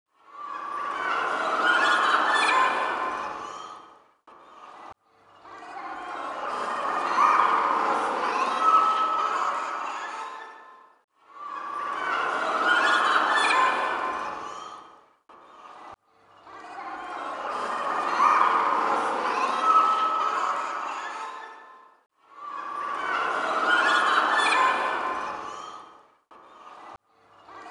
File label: Village Ambience; A Thriving Village; The Legend of Mata Nui; Game Music; Console Games